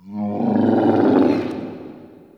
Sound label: Interior Moan Gurgle; Ambience; Indoor; Warframe; Infested Eris